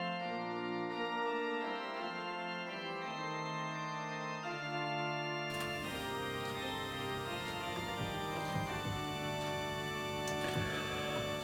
CONTRALTI

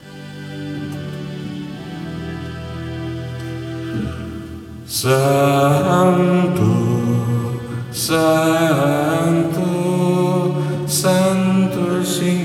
Contralti; Santo G M Rossi

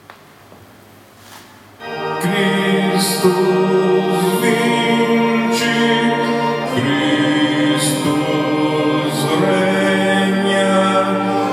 Christus vincit Soprani

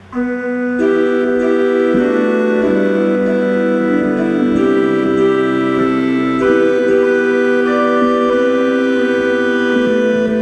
Agnello Di Dio Contralti

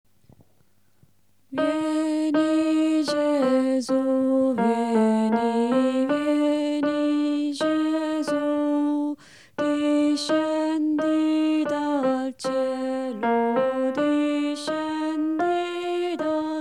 contralti; Innalzate nei Cieli

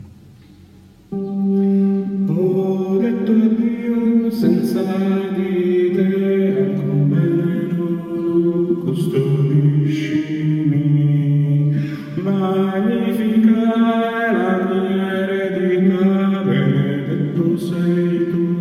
Custodiscimi Contralti

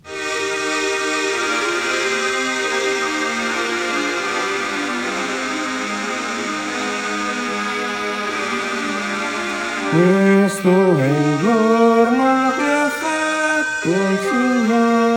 Questo E Il Giorno Soprani

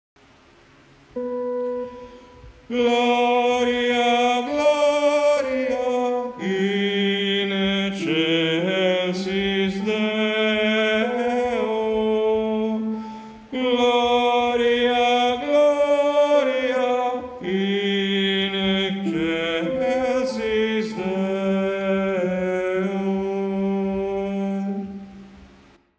Soprani; Gloria Lourdes 2013

